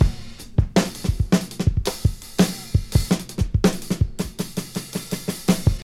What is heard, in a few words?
110 bpm fresh drum loop f key, kick tuned to the F note